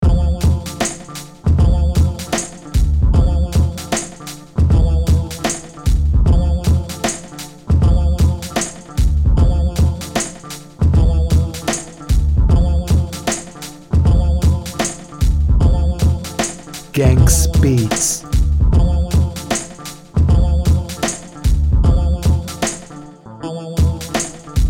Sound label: cool boombap rap beat